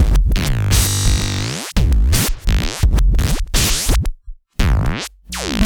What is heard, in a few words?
Structured Rhythm, IDM Rhythms